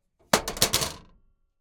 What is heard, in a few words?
tankietka var reload hatch closing; cmc6xt; mk41; mk8; Sonniss GDC